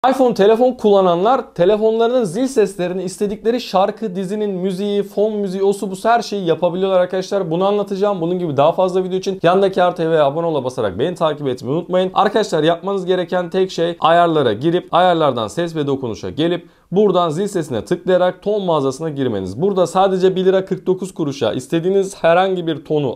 iphone zilsesi; ios

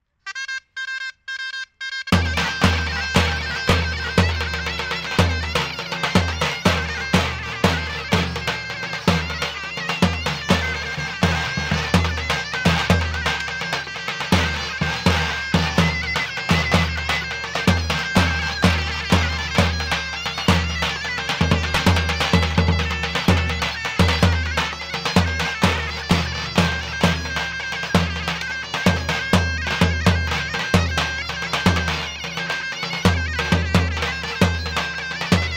davul zurna; iki ayak cift ayak; adiyaman; sanliurfa

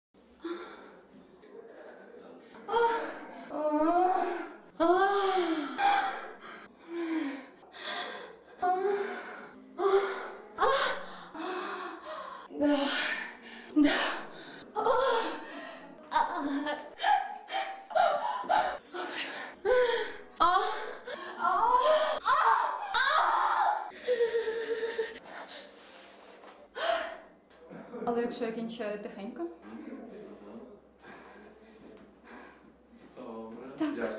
kadin orgazm sesleri

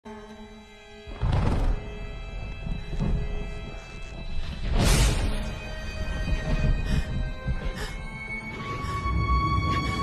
korku sesi

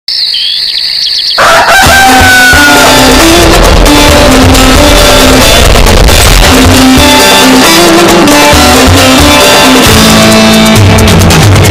jenerik muzigi ultra bass boosted; keloglan

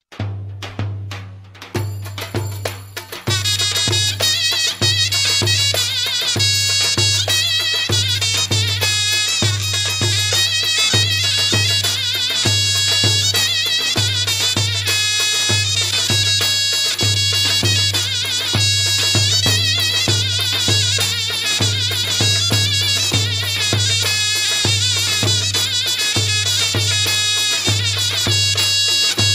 davul zurna

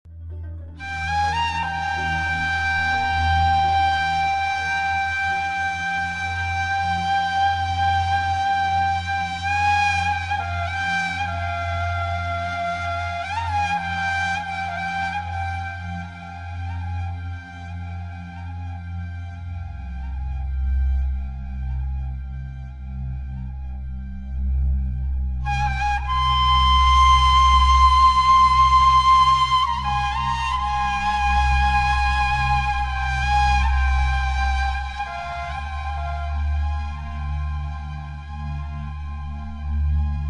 ney muzigi

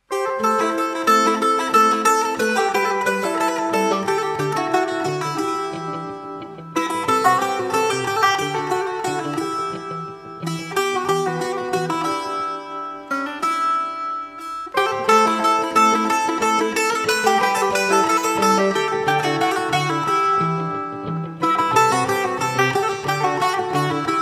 karaoke turkce

